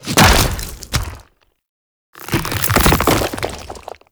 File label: Arm Entry; Warframe; Man In The Wall